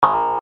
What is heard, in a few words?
alarm